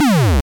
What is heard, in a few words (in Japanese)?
doon